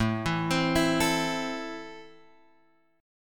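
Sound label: A Minor, Guitar Open G, chord strum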